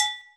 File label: tinsle, Bells